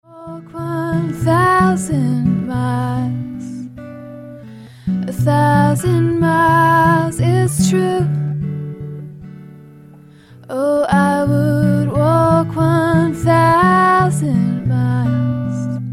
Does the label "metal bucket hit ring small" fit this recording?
No